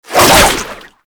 Whip Spin Whoosh; melee; weapons; Oraxia

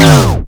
Osprey Shield Funnel Stop; Characters; Corpus

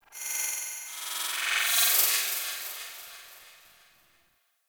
Tech Texture Small; Warframe; Ambience; Cephalon Weave

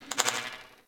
Beep Stutter; Warframe; Corpus

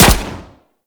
CY Xbow Shot C; Tenno

